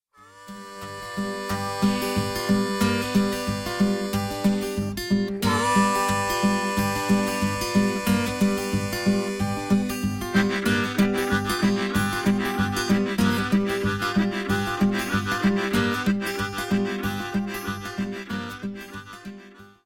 Cross Harp Train

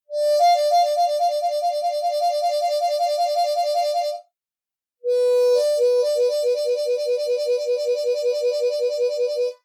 standard trills full tempo

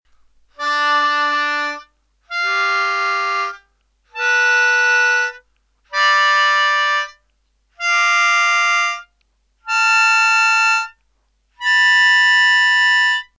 4 hole draw splits

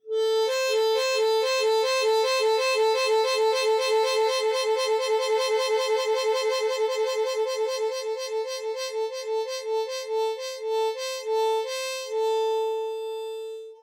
Controlled Trill, harp

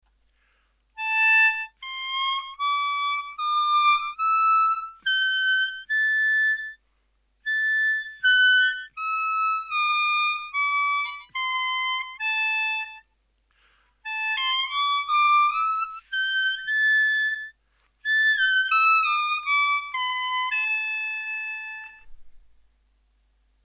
High End Blues Scale, harp

